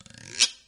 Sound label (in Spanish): peine